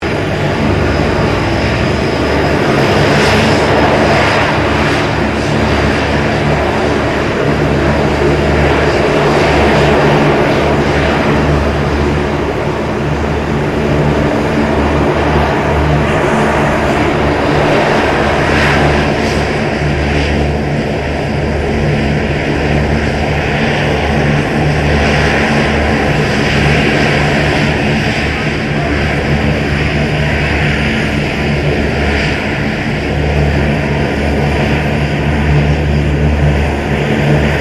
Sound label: 2008 C-27J SPARTAN IDLE, Spartan Transport aircraft; Airplanes; Vehicles